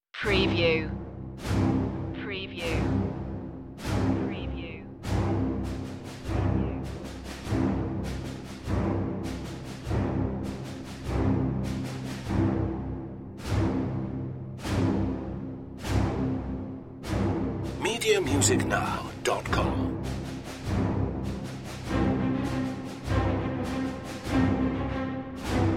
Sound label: dark opening no brass less perc